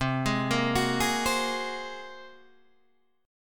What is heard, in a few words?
A sharp 7th Suspended 2nd Sharp 5th C